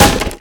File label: Wood Solid Impact Bullet; Physics